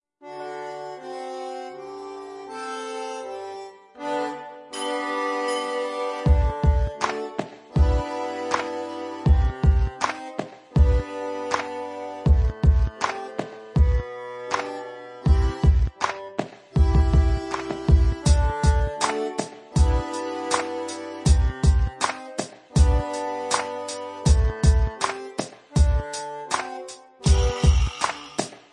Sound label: G string stomp accomp, viola; Hey Presto for viola bk 1 bronze